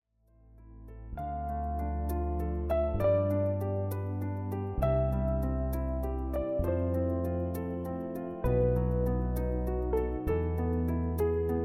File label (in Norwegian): Vuggesang, Pianist